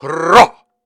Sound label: Grunts and Chants, Viking Vocal